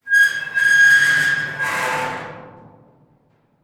Dumpster door, from inside, door, slide, rusted, screech, grinding, resonant, strong; Eiravaein Works; Vaeyan IV